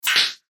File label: CLICK HIGH TECH; Clicks; Blastwave